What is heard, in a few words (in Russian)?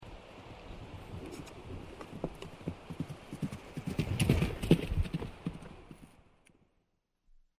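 по земле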